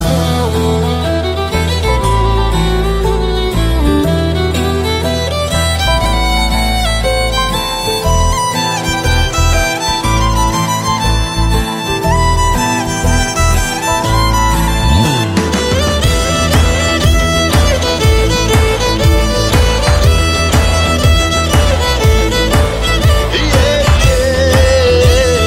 Instrumental Tone Ringtone

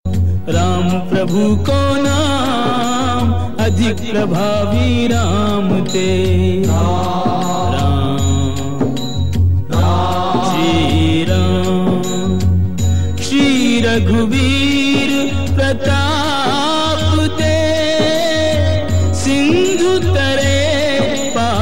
Ramayan Tones

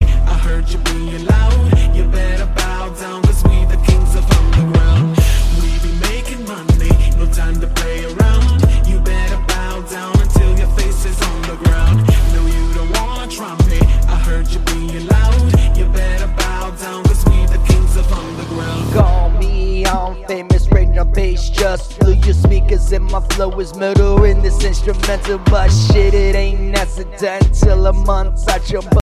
English Ringtone

